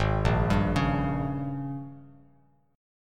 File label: G sharp Major 7th Suspended 2nd Suspended 4th, Bass Standard; arpeggiated; chord; 4,1,5,6